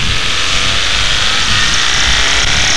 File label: ping, knock